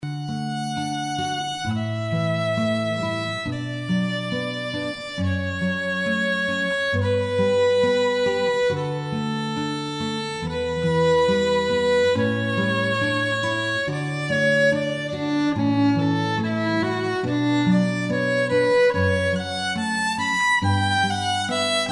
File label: Canon D; Pachelbel